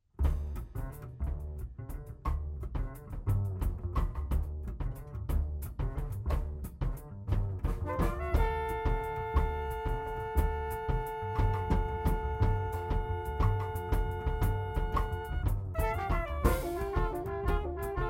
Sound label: riff intro